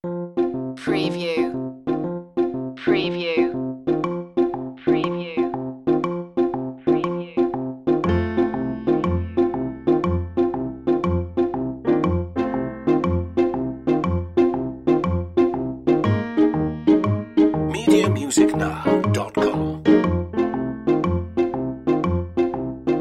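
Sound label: cartoonish tic tac underscore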